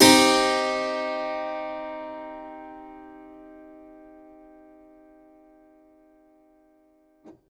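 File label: Piano Harpsichord minor; TrashLibrary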